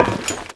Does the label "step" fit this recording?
Yes